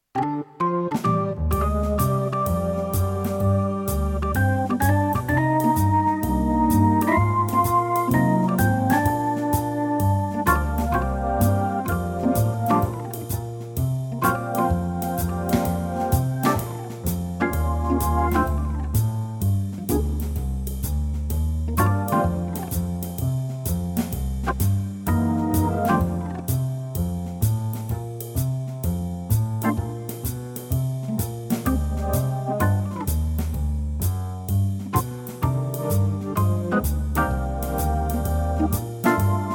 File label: Bb organ